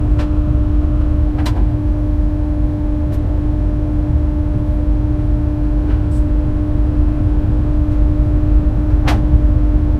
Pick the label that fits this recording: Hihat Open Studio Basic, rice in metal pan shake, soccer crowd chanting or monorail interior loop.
monorail interior loop